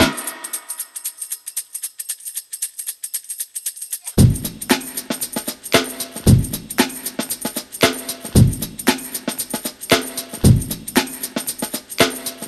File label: 115 Funky Tamb, Breaks; Lockdown Breaks